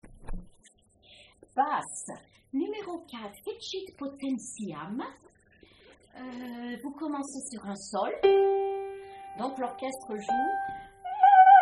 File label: Magnificat Basse; Vivaldi